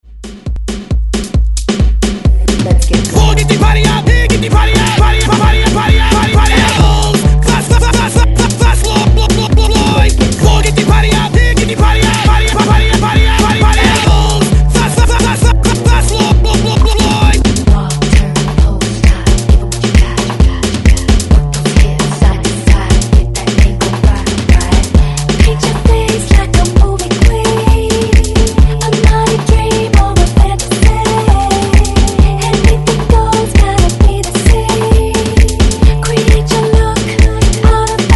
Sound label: Hype Re Drum 132Bpm